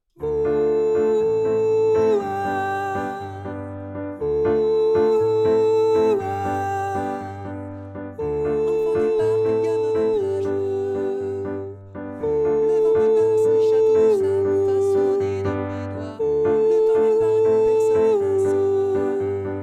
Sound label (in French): soprane